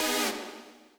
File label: bass